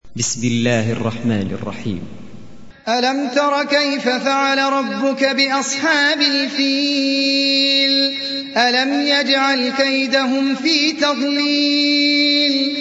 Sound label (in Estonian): Al Fil retsitatsioon, koraan